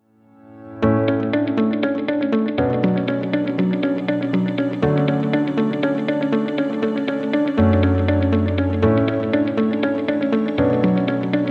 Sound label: Corporate Promo